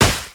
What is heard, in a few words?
impact; dota; crystal maiden